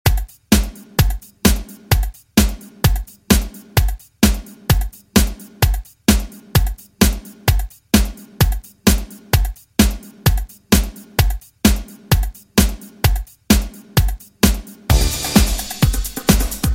Funk 80s Redrum 129Bpm

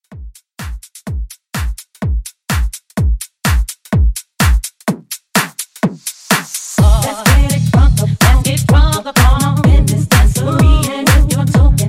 Tech House Jumpin Mashup 126

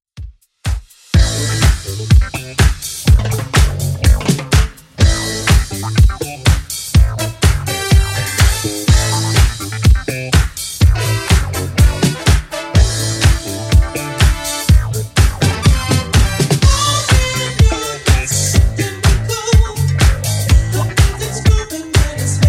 70s Redrum 124bpm

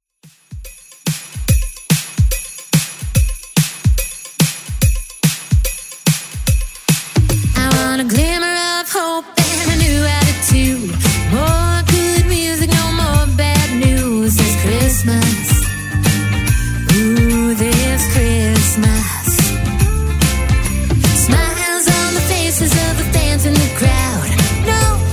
Christmas Extend Redrum 144bpm

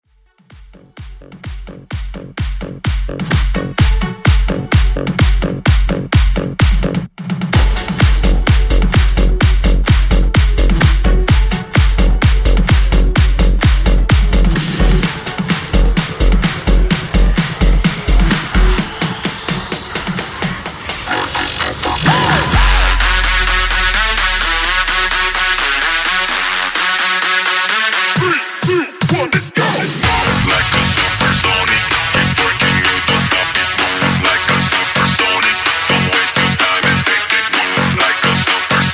Melbourne Bounce

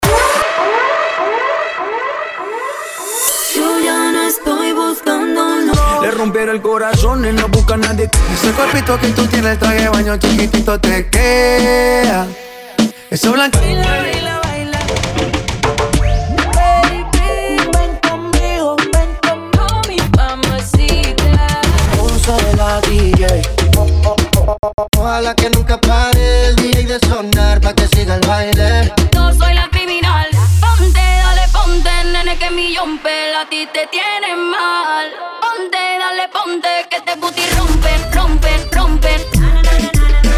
Reggaeton Hits; LA 22 vol 4

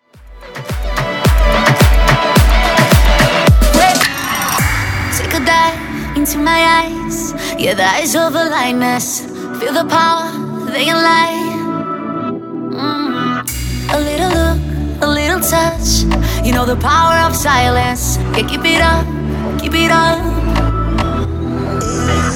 Moombahton 108bpm

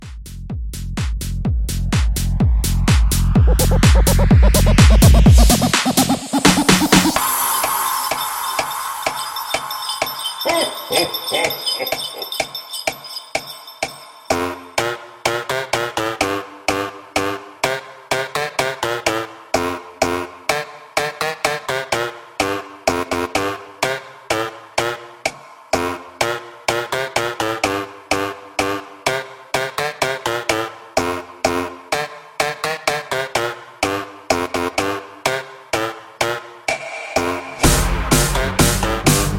Xmas House, 126

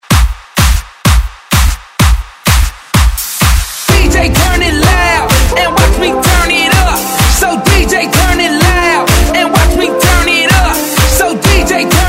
Hype Intro 127bpm

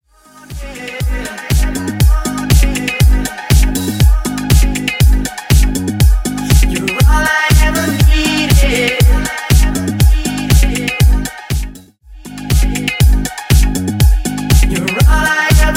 Re Drum 120 bpm

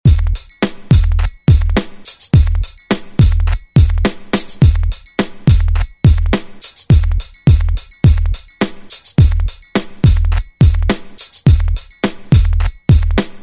DJ Intro Outro Scratch 105 Bpm

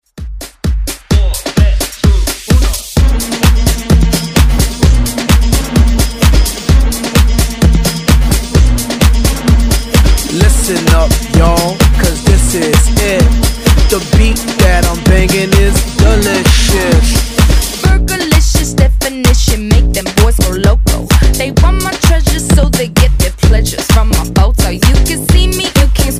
Afrobeat; Redrum

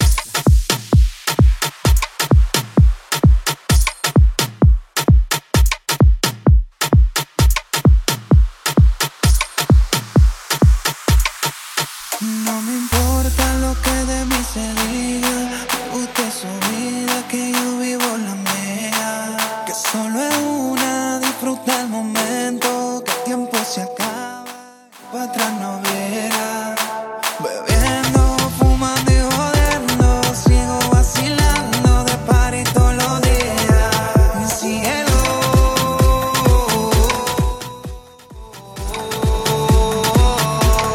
MASH UP AFRO BEAT

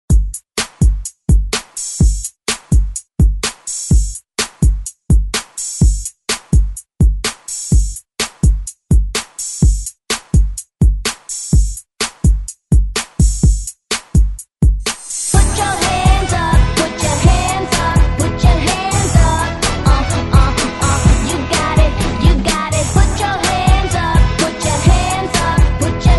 60s Hype ReDrum 126Bpm; DJDOLLS

